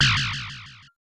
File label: Coin; Contra; arcade